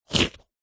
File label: human eat bite crunch cracker single